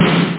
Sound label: weird sdrum